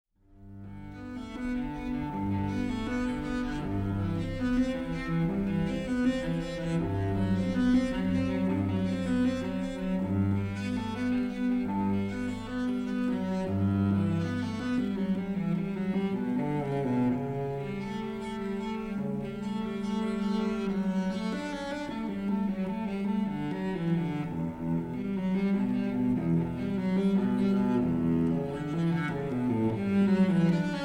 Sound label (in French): Suite en sol majeur pour violoncelle seul Prélude Allemande; BACH; BWV 1007